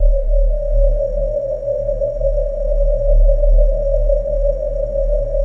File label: Recharge Pad, Jungle; M8